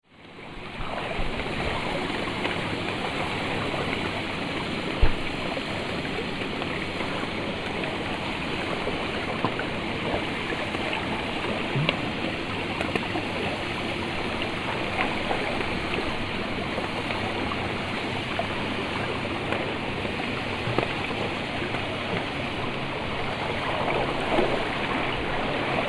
monaural recording near "torpedo warf", San Francisco